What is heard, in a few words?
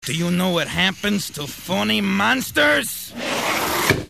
Phoney Monsters; Halloween; Hallmark Halloween 1989